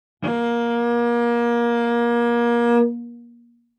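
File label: Cello arco fortissimo sul G Bb2; MIS Pitches - 2014; Strings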